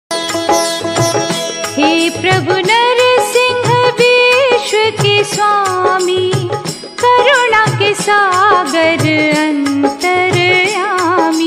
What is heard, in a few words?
music ringtone; narasimha